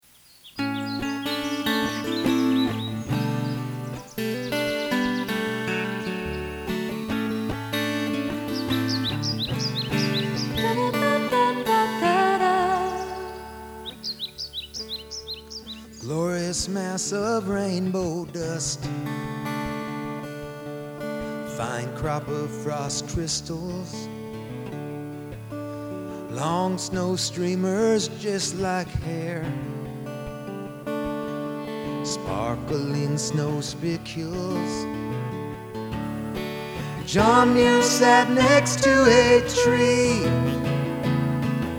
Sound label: duet; john muir exhibit